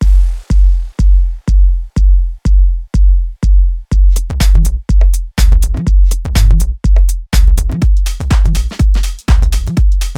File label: House Kick Drums; BigKick Epansion